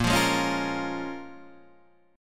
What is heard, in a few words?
A sharp Minor 7th, down, Guitar, Drop D